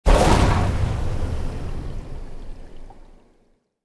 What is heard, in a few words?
Submarine torpedo launch